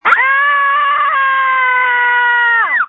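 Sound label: Scream, phone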